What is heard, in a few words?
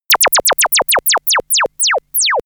laser machinegun; krieg-waffen; soundpool